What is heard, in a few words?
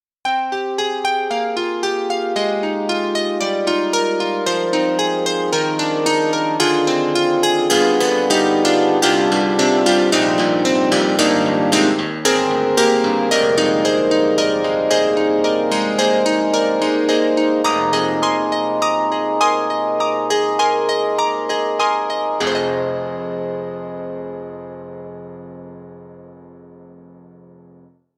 Piano; KORG; KRONOS 2